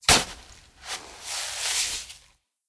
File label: Shovel Sand; blue archive